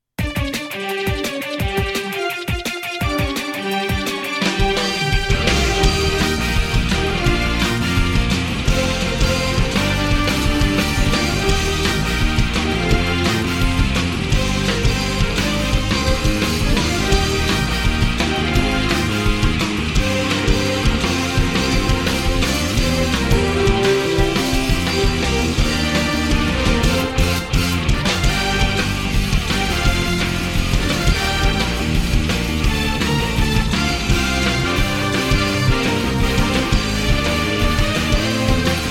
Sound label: theme instrumental